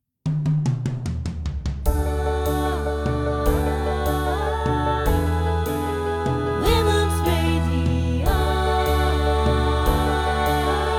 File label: Jingle; WRS